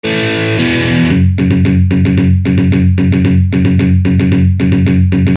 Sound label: Lick